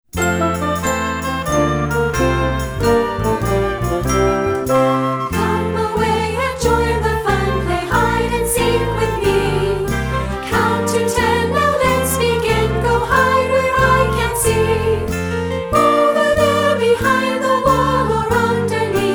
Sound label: vocals